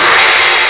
china cym

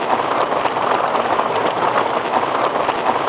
Turf, beach; HangSim

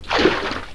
Splash; The Learning Company

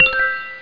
get Square

